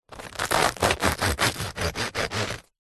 food bread loaf crispy paine de casa cut with bread knife